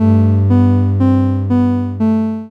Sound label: chord